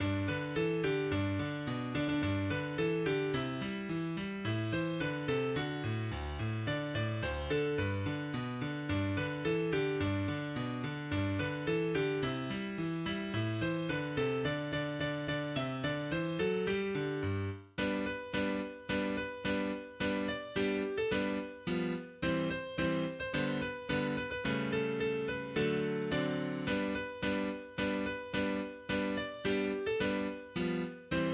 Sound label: jingle bell